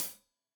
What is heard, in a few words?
Hat Tight, Hi-Hat, Cymbals; Deeply Sampled Drums